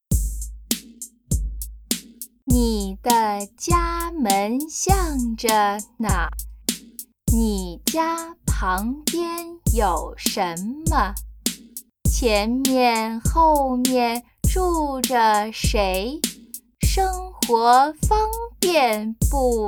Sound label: Chant